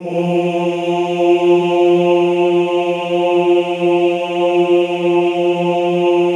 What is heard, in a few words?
OOH F2, Classical Choir; Best Service; Extended Classical Choir; OOH MIX